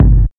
natural steel kick drum g key, Royality free kickdrum sample tuned to the G note